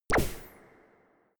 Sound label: space laser gun bubble shot; krieg-waffen; soundpool